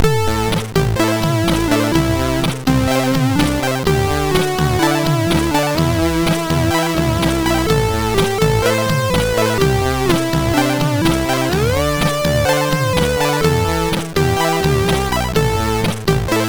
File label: Short Tune sid MOS6581R3